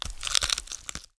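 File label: skeleton ambient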